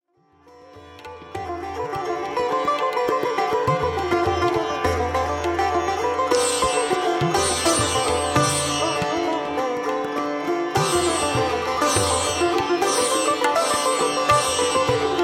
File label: Bageshri Ektal; Kumar Bose